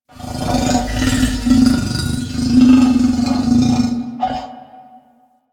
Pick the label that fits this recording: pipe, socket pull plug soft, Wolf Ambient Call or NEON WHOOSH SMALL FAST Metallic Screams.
Wolf Ambient Call